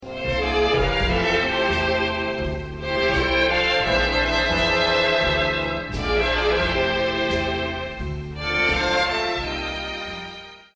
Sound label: string melody